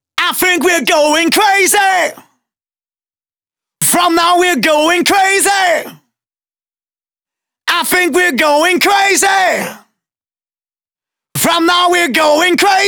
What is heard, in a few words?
Male Vocals DRY, 128 BPM; Vengeance Samples; Vocal-Hook Kits; Song Kit